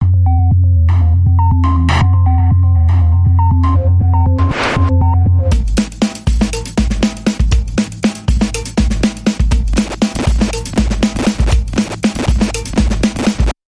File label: Tactile Hip Hop Bumper